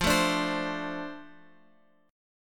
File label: F 6th F down Guitar Open F; F6 strummed